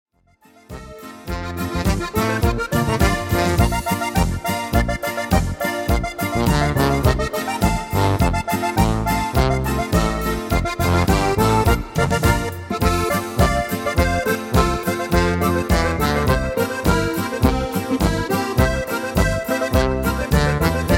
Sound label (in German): Boarischer